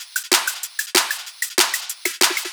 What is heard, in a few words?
Top Loop